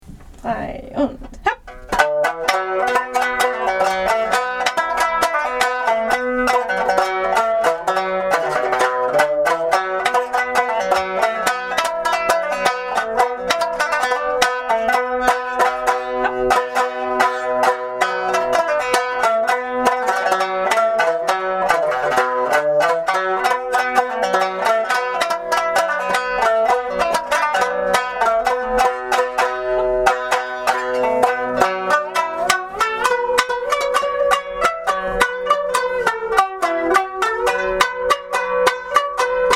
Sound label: shamisen